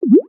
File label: bubble; ChristmasFurious